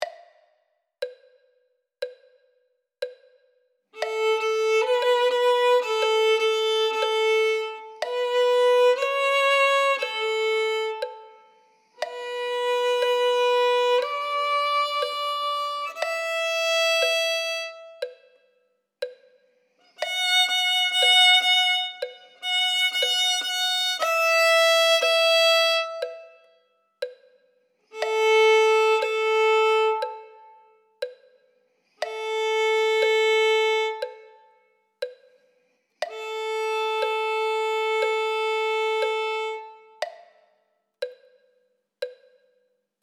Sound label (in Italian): violino I